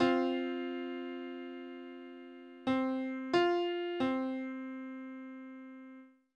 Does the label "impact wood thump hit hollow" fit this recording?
No